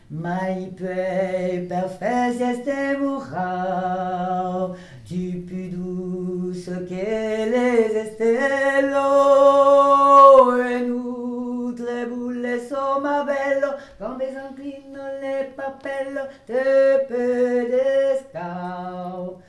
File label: VOIX